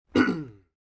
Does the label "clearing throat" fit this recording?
Yes